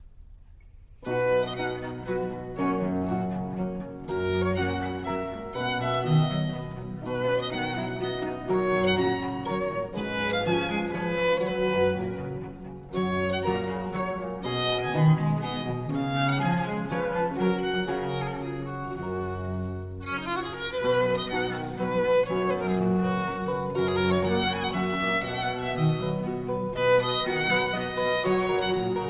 Concerto for solo violin, 2 cellos & strings in C major Largo; Vivaldi